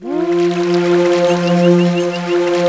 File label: water, ambi; WORS STATION; AMBI BLEEP